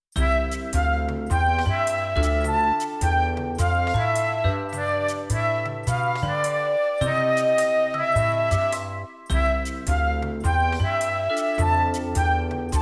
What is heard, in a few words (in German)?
Musik Mäusemelodie; Cornelia Funke erzählt von Bücherfressern